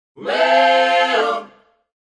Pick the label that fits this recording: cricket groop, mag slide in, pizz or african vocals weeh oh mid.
african vocals weeh oh mid